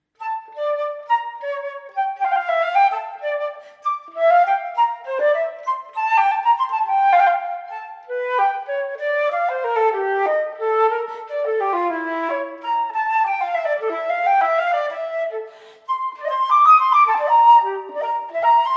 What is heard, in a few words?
Flute Grade 7 Bach Gigue performance; arr Southworth; ABRSM